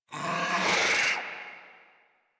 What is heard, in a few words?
Infested Charger Run Breath, Enemies; Warframe